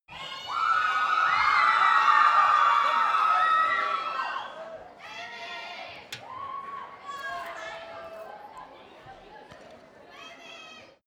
shout young audience theatre back

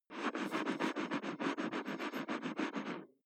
mouth rinse water

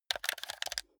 console controller play sequence short fast

